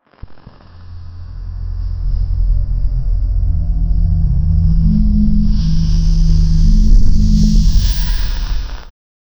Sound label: massive arc of purple lig, energy sphere